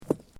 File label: Bricks Movement Minor